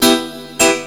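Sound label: PIANO loop; Ragga & Dub Reggae; PIANOS; AKAI; USB Soundscan vol.27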